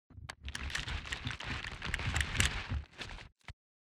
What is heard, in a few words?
movement walk; Ranger